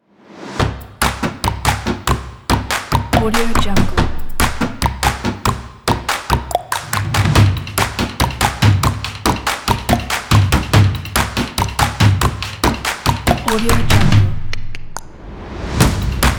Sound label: stomp clap ident